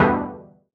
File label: Synth DMaj; Unit Rcrds